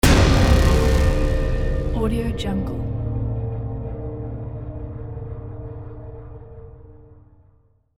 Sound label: orchestral punch